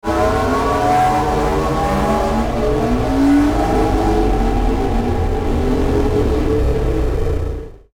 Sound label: Ascend Warp Slow Build Up Static Swell; Blastwave